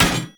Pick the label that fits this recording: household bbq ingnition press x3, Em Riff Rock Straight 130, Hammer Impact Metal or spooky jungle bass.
Hammer Impact Metal